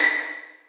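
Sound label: 2nd violins pizzicato a#3; Sonatina Symphonic Orchestra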